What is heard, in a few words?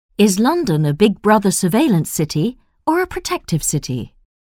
techno